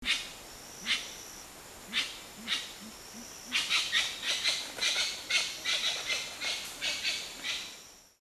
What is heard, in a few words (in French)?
agami trompette; route de kaw